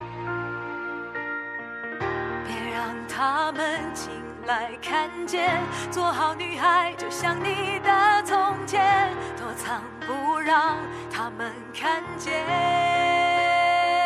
chinese cartoon

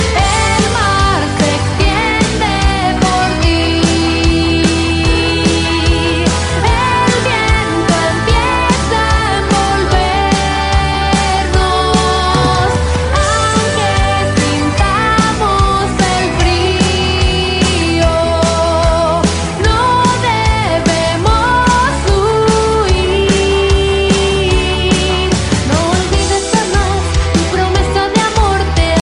latin ringtone

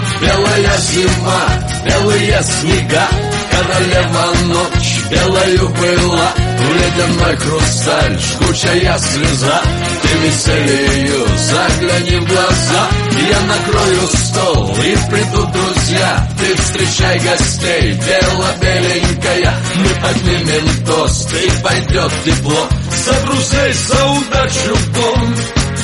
russian music

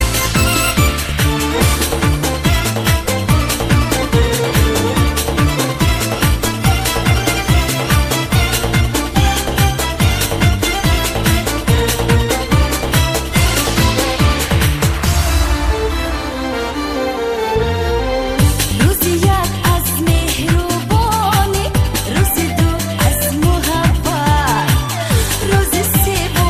tajik ringtone

